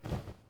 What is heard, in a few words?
cloth drop